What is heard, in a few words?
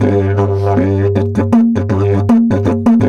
Didge 80 BPM, Didgeridoo